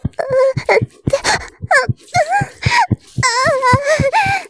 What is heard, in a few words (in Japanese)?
絶頂 潮吹き, ASMR; 騎乗位